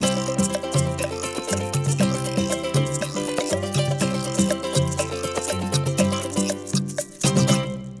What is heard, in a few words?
percs